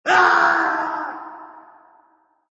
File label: death; hl2dm